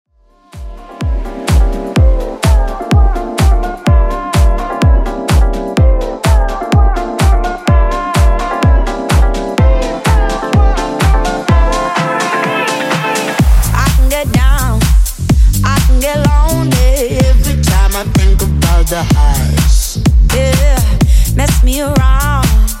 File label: Top40 DJ Friendly 126bpm